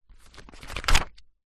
foley paper page turn large binder; zapsplat

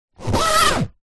foley suitcase zipper

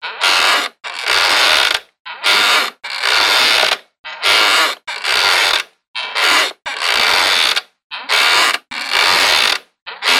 rocking chair creaking; household; sound effects; sound effects one

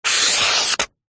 office pen felt marker single stroke on paper

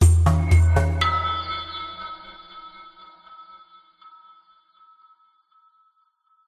musical logo branding signature percussive; trusted established intellectual

